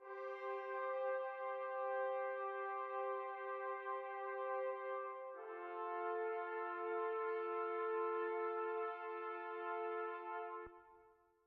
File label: music interactive; World of Tanks